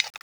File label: uzi clip out